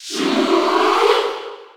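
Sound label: Cheer French, crowd; Shulk; SSB4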